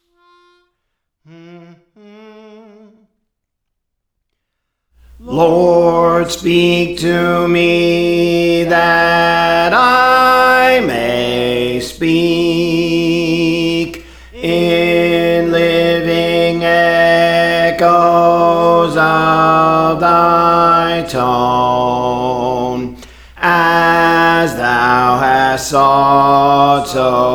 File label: Bass, Songs; Praise For The Lord Training; Lord Speak to Me